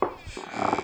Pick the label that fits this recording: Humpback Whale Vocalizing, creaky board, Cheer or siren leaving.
creaky board